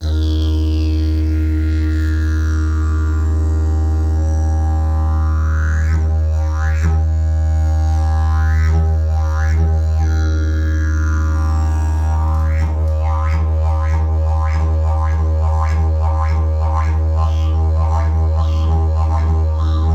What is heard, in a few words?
didgeridoo; jilm uni